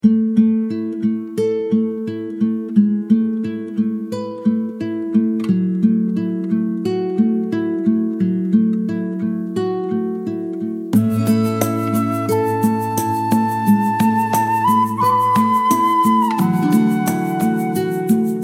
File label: forest wanderer s path